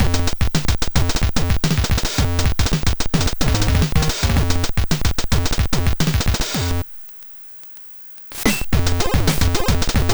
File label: sndtest gbasp; gbsoundcomparison